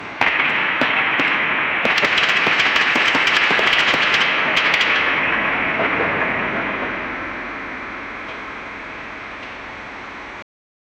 the sound of a building collapsing after a massive explosion; chaotic